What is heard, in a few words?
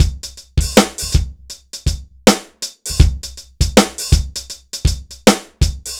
dry Loop BPM 80 Dry, AKAI S6000